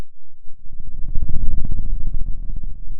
Sound of a dinosaur

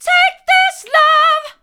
take this love hard, vocal

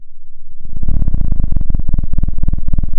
pop, Sound of a cat purring

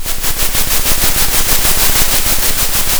center, Sound of a train

